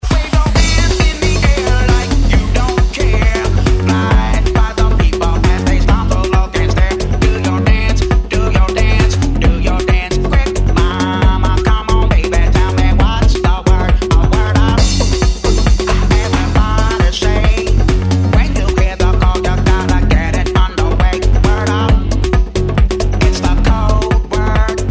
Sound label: top40; powermusic